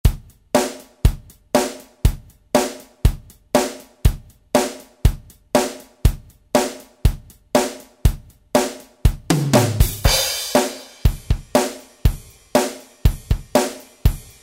8-Beat, 16-Beat, Shuffle, 6-8 Feel patterns, Boss DR-770; Preset Patterns